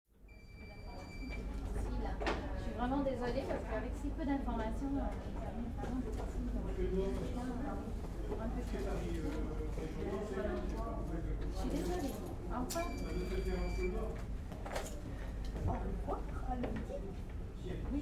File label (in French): Ecoute hopital Accueil Geriatrie